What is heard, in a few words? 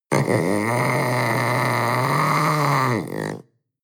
panther young growl